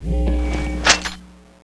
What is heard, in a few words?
piano gun draw, weapons